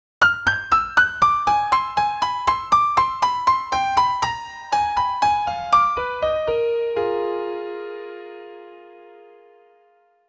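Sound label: positive simple piano melody, jingle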